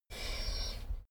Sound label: dogo argentino whine snort; natur-tiere; hunde